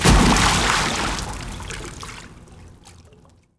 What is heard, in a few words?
splash impact; cstrike